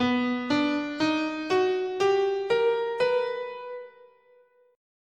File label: B Augmented, scale